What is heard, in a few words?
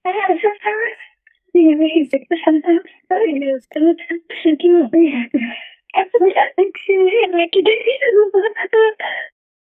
a girl sobbing calling, apology